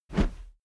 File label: whoosh heavy low; swooshes-swishes